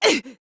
Pain, Female; Warframe; Modular Corpus Female; Battle Barks; en

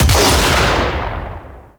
Shotgun Shot; Kahl; Symbilyst Finisher; Warframe; The New War

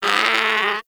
Predator Vocal Pain

